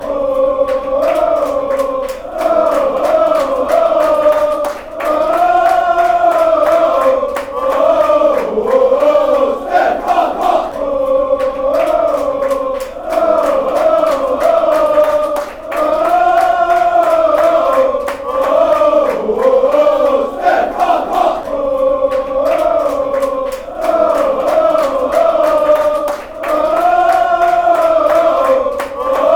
woah oh; stephanois; Association Sportive de Saint-Étienne Loire was founded in 1919

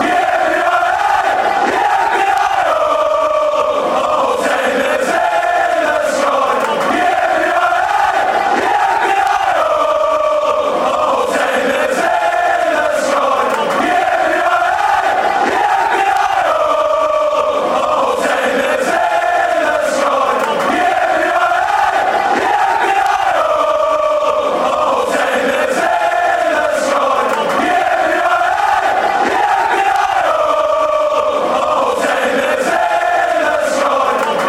yippi aye eh yippi aye, fan chants

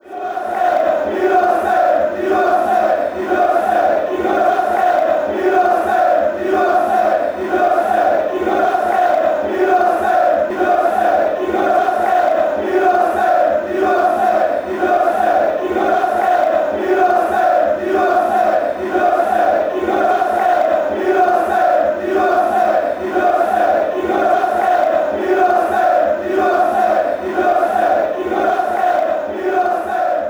lille osc

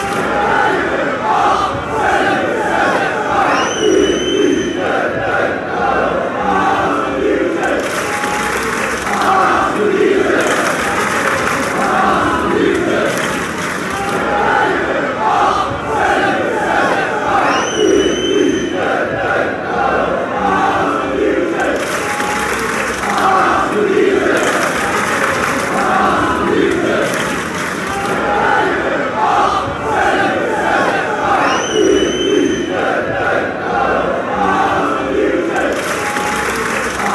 den haag chant

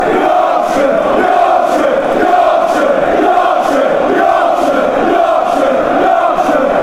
yorkshire yorkshire

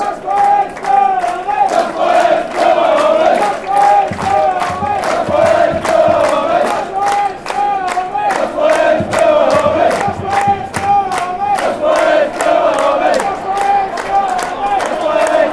barmy army, fanchants; gus poyets; Tuneful rouser for the team for the boys in blue and white. Tope Brighton football chant

